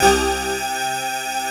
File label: HOLLOW SYNC, SYNTHS; Pro-Rec - Synthsonic